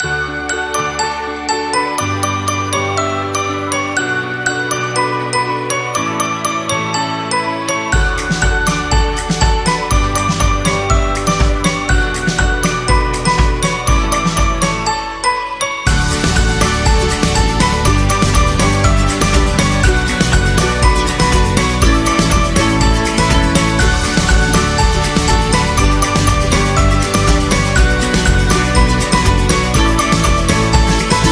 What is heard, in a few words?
Food Commercial